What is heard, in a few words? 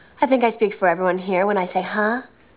huh; buffy